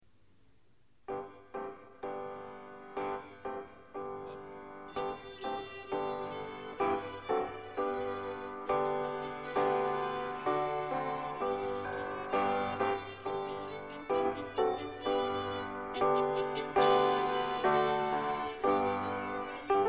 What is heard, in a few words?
Music